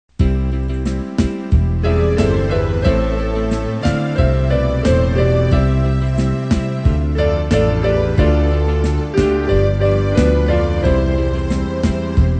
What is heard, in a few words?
ALTO